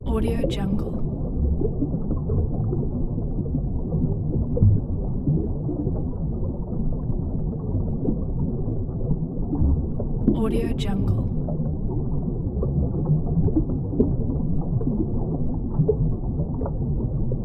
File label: underwater